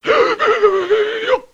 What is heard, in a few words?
LoFi Gurbles; Vintage Cartoon; Comedy Vocals